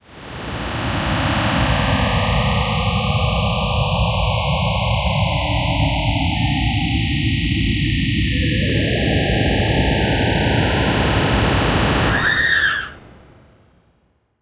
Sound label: Space fear